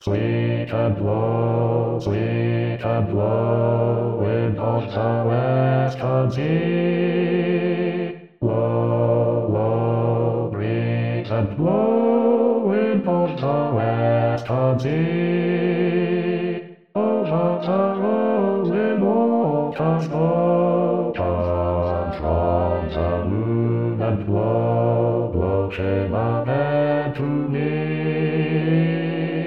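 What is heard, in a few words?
Sweet and low Bass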